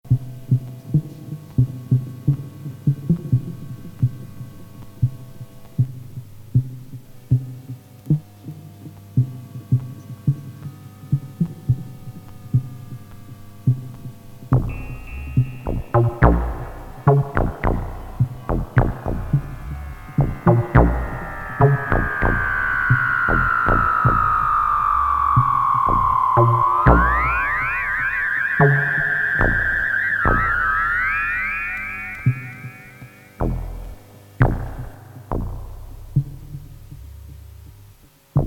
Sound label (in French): orgue electronique; IntergalacticFM; Festival 2021 (Streaming)